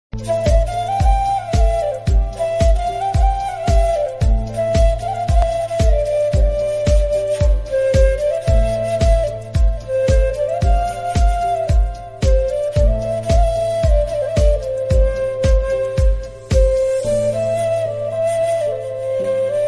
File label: song flute ringtone